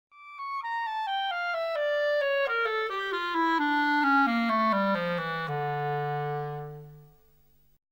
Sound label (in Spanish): escala descendente